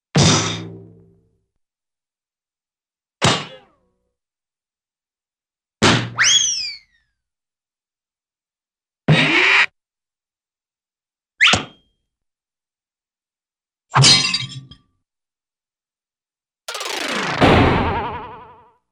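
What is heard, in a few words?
Impact with cymbal Drum Whistle Bong; Cartoon; Hollywood Edge; Cartoon Trax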